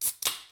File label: can open; Items